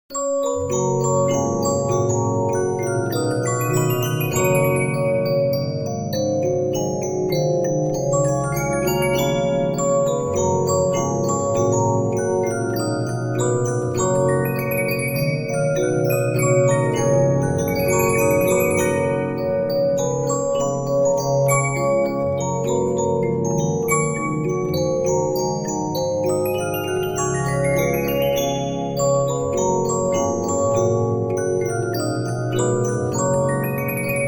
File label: Regina, 15inch disc musicbox